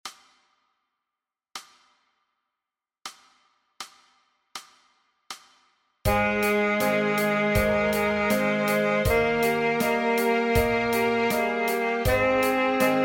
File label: F Scale